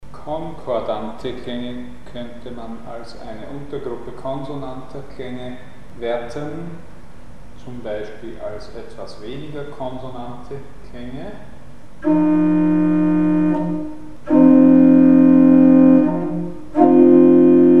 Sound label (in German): Konkordante Zusammenklaenge; Mikrobuch